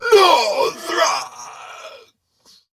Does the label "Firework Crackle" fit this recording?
No